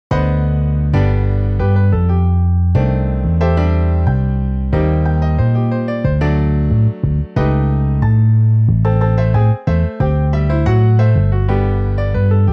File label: Piano Bass; MIDI